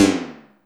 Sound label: PFX, 130, 2 Step; USB Soundscan vol.51; House Side Of 2 Step; AKAI